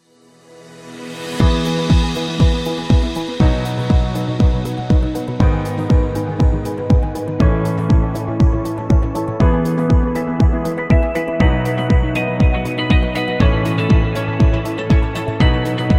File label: This Is Corporate